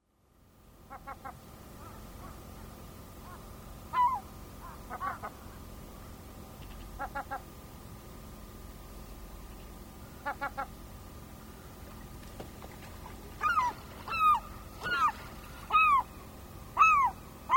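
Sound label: Slaty-backed Gull; tomamae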